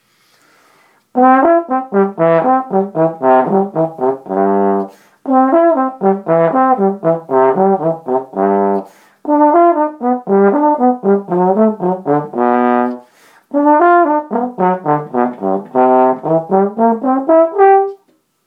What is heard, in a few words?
trombone